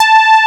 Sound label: MOOG A4; Moog Synths; E-MU Formula 4000 Series Vol. 3; Analog Odyssey